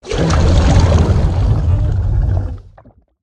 Body Death Sound

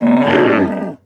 Attack

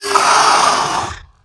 Raptor Death

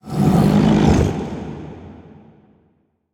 Mana Resevoir Attack

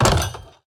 Mace Medium Death Underlay

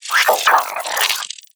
Scarab, Play

